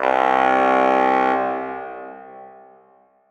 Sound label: Sax C